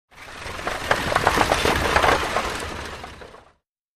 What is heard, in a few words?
Carriage By Fast Grav, carriages